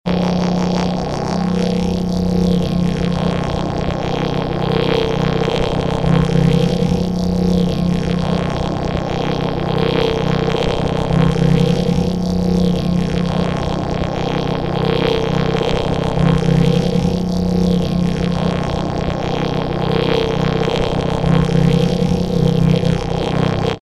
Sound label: didgeridoo transform